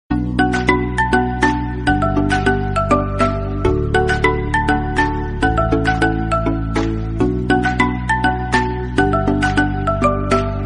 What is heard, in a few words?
BGM TAMIL RINGTONE; DOCTOR